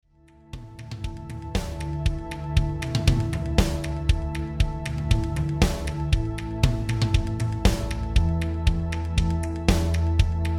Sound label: rhythm